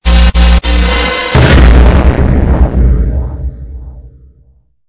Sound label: Stinger; STINGERS